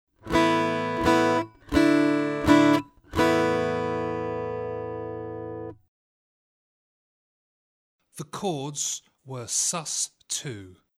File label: Aural Harmony Test, acoustic guitar; Grade 3